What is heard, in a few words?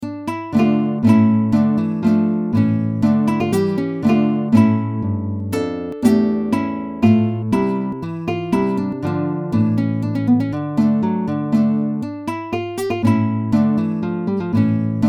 nylon string guitar